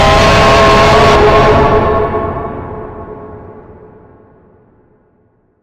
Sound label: jumpscare sound; jolly project j